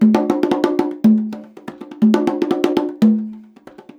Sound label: 120 CONGAS, Percussion Loops